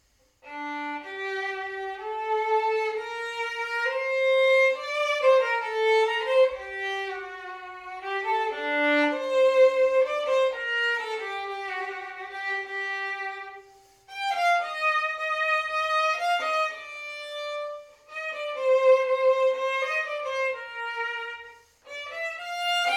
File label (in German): solo getragen g moll, trauergeigerin; Vivaldi